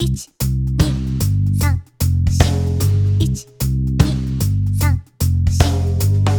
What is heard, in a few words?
backbeat reggae